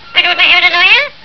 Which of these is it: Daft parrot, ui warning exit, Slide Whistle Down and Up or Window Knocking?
Daft parrot